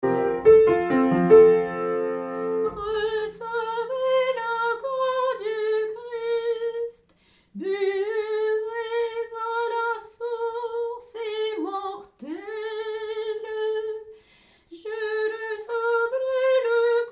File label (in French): Recevre corps Tenor